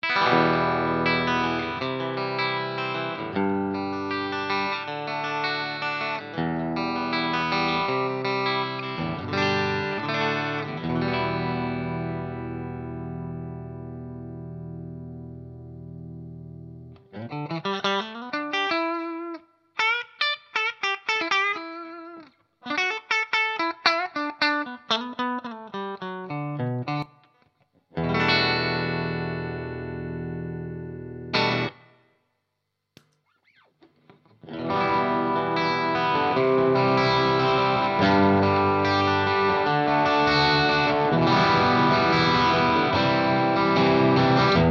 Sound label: strat bridge midgain; fulltone ocd